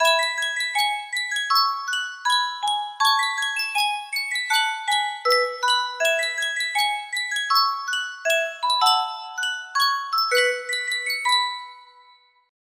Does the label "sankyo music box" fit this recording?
Yes